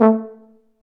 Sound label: F TUBA, stacc; Roland L-CDX-03 Disk 2; BRS Tuba